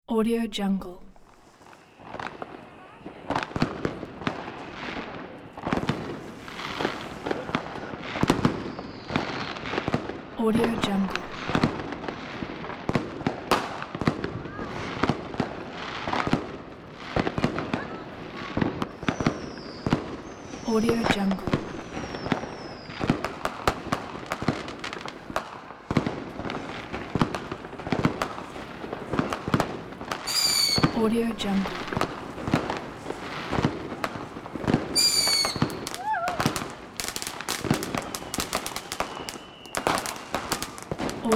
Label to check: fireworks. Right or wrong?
Right